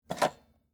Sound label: Telephone Receiver, Technology, Telephone, Receiver, Pick, Up, Wall, Phone, Antique; Telephone Sound Effects; BlastWave